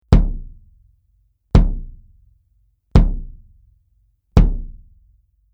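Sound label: BASS Clr Bury Beater, bass drum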